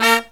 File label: LOW HIT, Brass; USB Soundscan vol.29; Killer Brass Riffs; AKAI